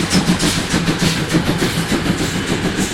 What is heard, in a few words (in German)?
Dampflok DB 64 491 Lauf